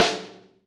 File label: kill